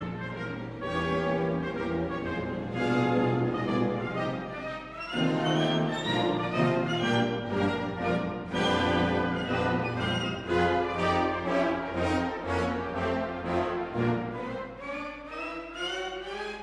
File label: Triumphal March and Chorus; Verdi; Aida